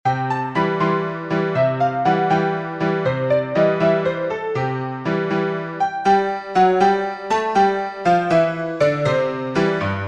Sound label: March in C, piano; Marlborough